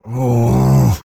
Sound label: Man Frustrated; voice; Zomboid; Lifestyle